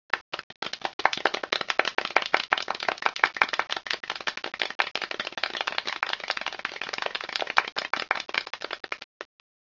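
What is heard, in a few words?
hakusyu fukusu, clapping of many people; hito ge hakusyu fukusu01; easyrpg; WitchsHeart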